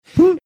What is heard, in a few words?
cute sound